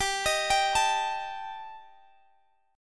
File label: G Suspended 2nd Sharp 5th G, Guitar, Open G; chordshape; down